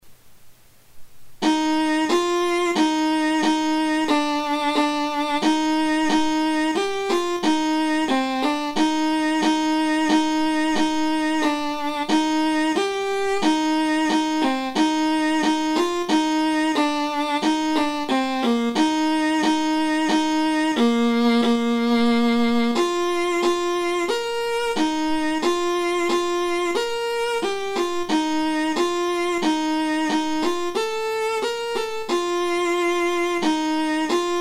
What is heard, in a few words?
alto midi